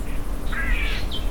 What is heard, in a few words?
Cacicus solitarius woyeer